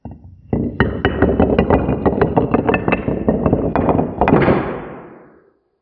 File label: device in cave